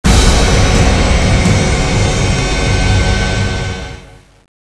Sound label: zombie result